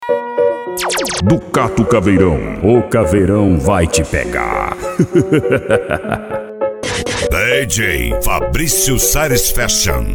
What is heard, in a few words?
funk mega bass